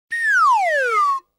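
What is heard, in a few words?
slide down whistle, funny cartoon sounds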